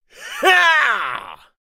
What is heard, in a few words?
Crushing Blow